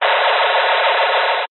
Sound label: science fiction radio signal interference burst